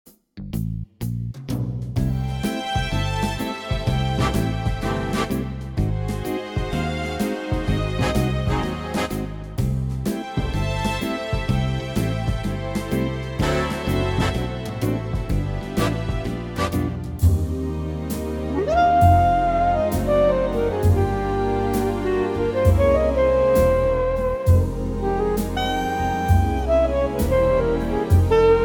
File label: Orche